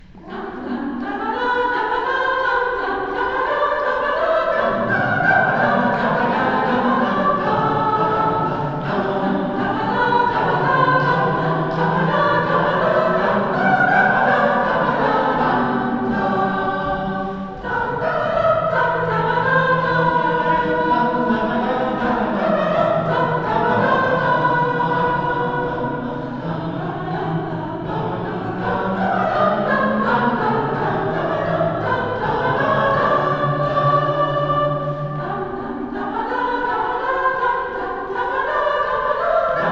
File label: Menuett